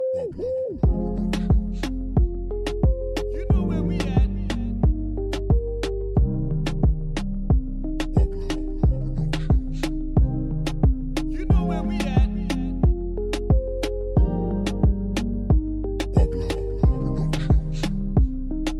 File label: Latin Reggaeton